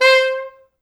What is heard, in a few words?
C4, Pop Brass